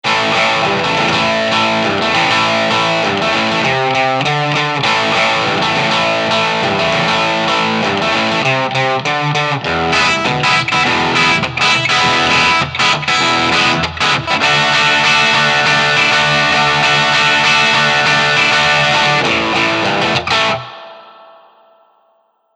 Neck Tube Screamer

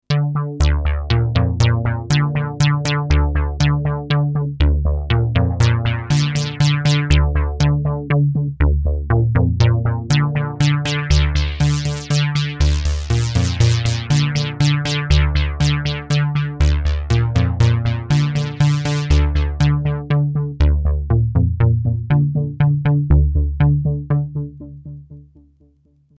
Arp; NNXT Arp4U

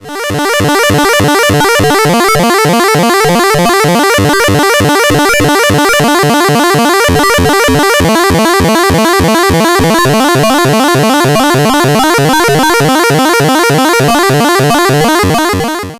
Retro Arp; Soulsby Oscitron

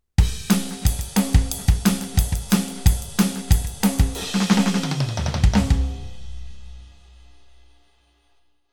Roland TD07 Punky

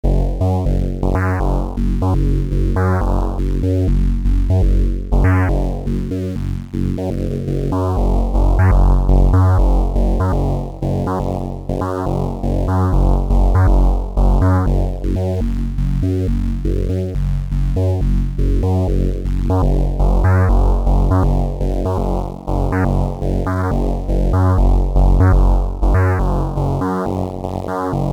OB-E Bass Sync

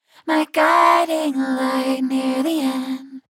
Vocals Rotary Strange Resonances; ROTARY CLS 222

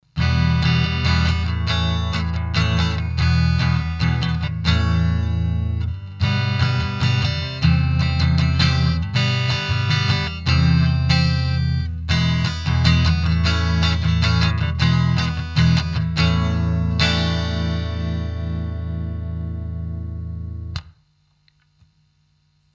Telefunken M82 E Gtr clean Kick on Boost on

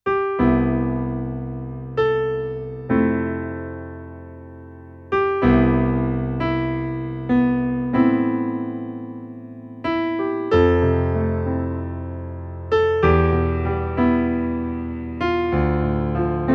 Piano; Casio CDP-S350

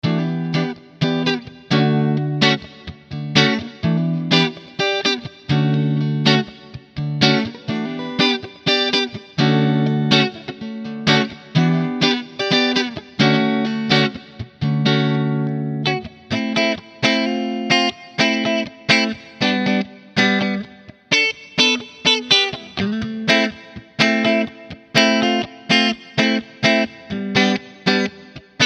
Hagstrom Coilsplit Neck to Bridge

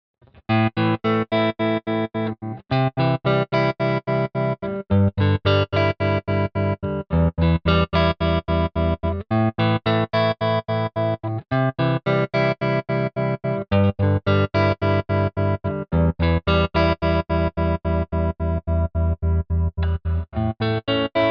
Tele Clean Tremolo

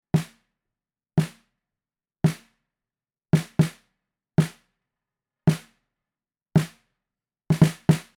Snare; Schoeps CMC1 MK4

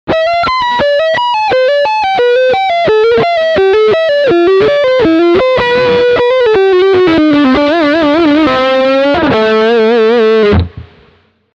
Humbucker Front Lead

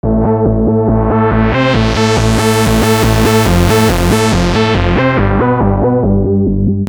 Profan 3 osc

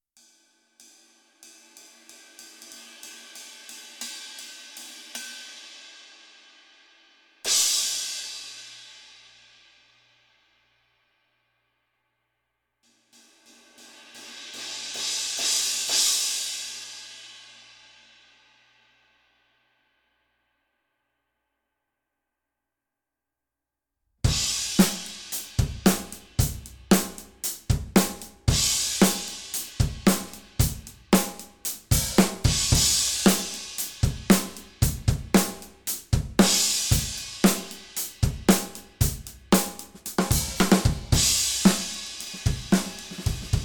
Jazz Thin Crash

